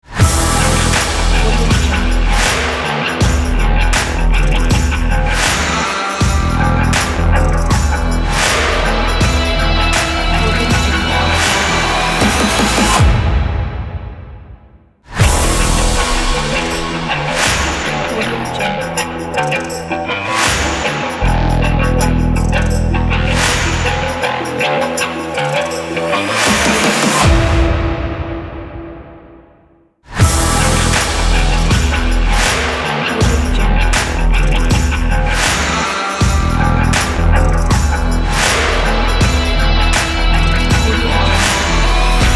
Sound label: slow energy beat logo